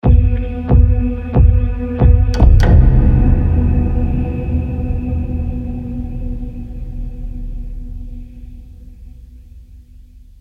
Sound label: Tension Hits Infected Chase End